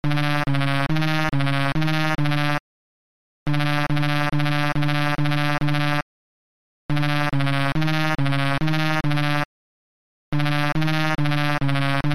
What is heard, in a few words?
Attic Damage